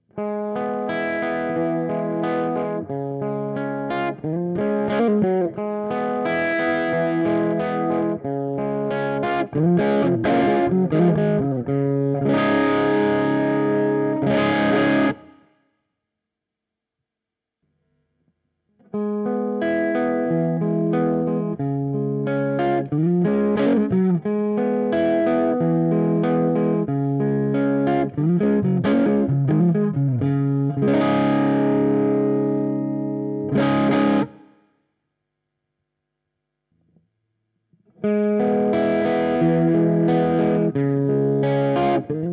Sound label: Chords Marshall; JAG-STANG